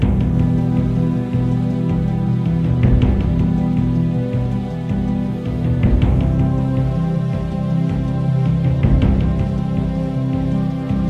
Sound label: Sealed Castle